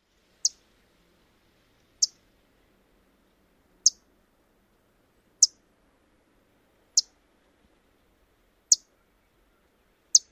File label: alarm chips